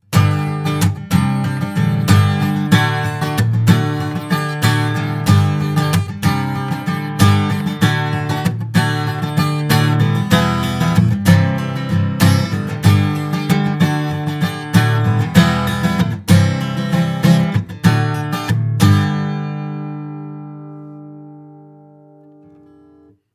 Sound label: SE Electronics VR1 Acoustic Guitar